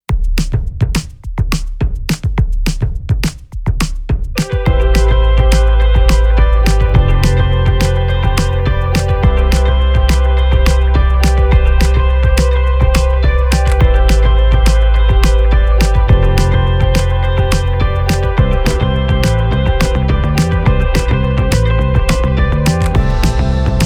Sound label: Birds (Instrumental)